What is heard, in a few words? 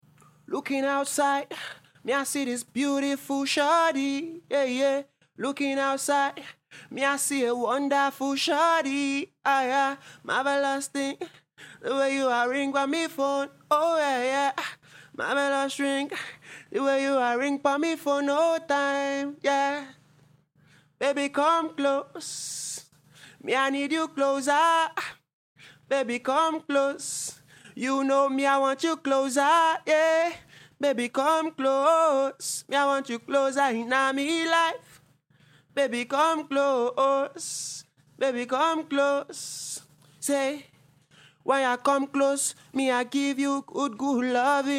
pop rnb 98 EM